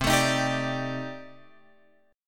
C Minor 6th Add 9th, C, down, Guitar, Open F; strummed; JGuitar

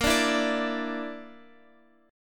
A sharp Major Flat 5th, Guitar, Open F; strummed; JGuitar

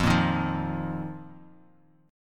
F 7th Suspended 4th F down; strummed; JGuitar

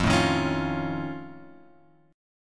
F Augmented 7th F, chord; F+7 Chord {1 0 1 X 2 1} strummed

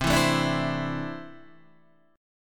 C 6th Add 9th C down Guitar DADGAD

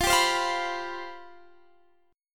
C Major 7th Suspended 4th F

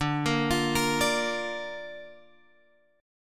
D Minor Sharp 5th D down Guitar Drop D

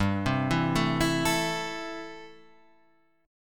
A Minor G, guitar

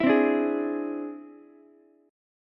E Augmented 7th E down Ukulele; E+7 strummed; JGuitar

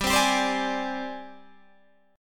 G sharp Major 7th Flat 5th

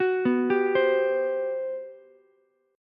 G Major 11th, Ukulele C6; arpeggiated; JGuitar

